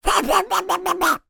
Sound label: cartoon duck voiced by human shouting angry